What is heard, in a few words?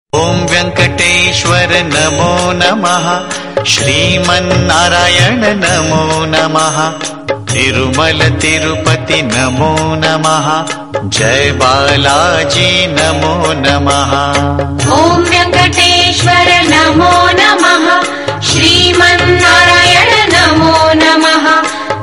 Mantra Ringtone; Balaji